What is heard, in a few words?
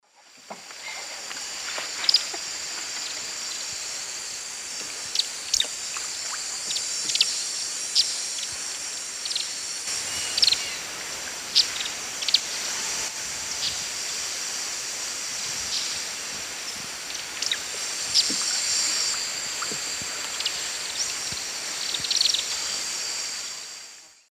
Atticora fasciata, calls; S. America; Eastern Lowlands